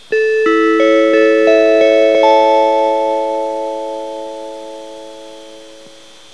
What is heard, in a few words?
chime; JR北海道キハ283(281)のチャイム(その1); 「スーパーおおぞら」や「スーパー北斗」に使用されているキハ283系で使われているチャイムです。; その1は途中駅到着前の自動放送の冒頭に入るチャイムです。なお、キハ281系でも同じ物が使われています。; 鉄道唱歌のチャイムです。